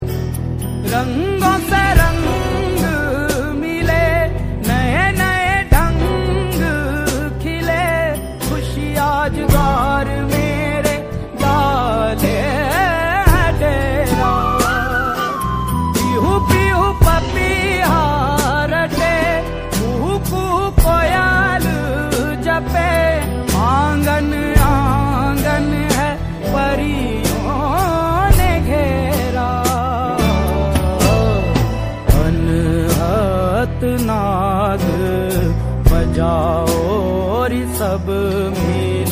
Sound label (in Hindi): Bhakti Song